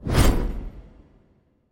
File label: giant fire swing; smite; vamana